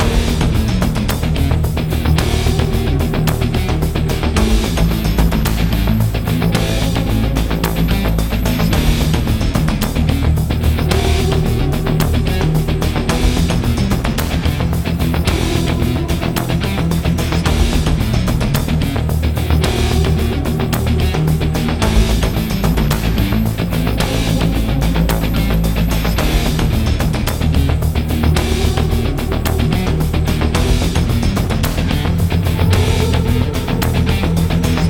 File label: BGM Police